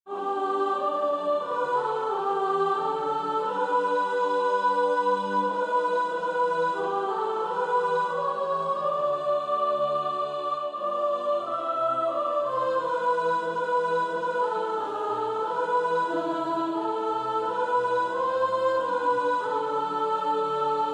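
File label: soprano; Rejoice Ye pure in heart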